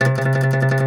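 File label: OUD tremolo, Plucked Strings; E-MU Producer Series Vol. 7 – Old World Instruments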